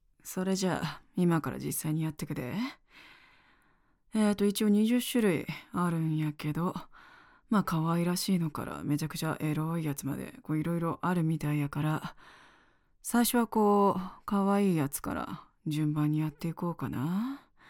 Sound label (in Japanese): 実演フェラチオ; 実演フェラチオ体験会